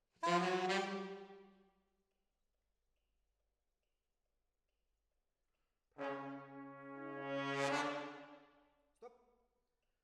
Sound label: Decca, Jazz for piano